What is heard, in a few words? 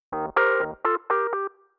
125 Rhodes C; PowerFX - Nu Jazz House